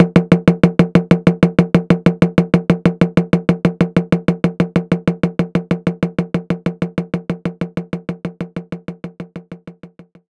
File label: Jazz Bass Drum